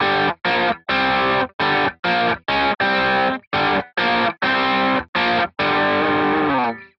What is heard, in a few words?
guitar